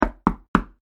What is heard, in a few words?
Foley Door Knock Solid Door; Objects